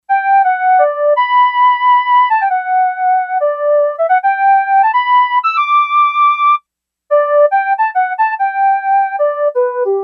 Flute 2, Yamaha DX11; Factory Preset Voices

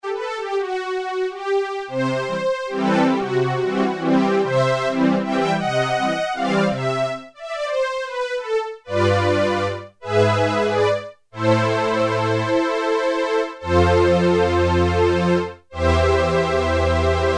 String II, Roland JX-3P

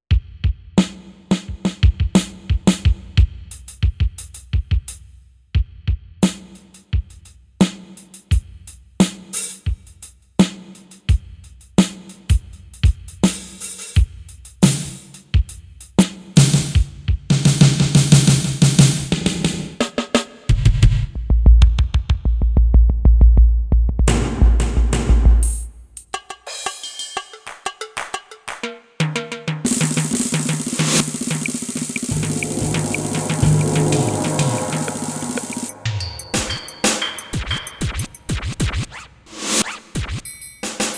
Total Kit

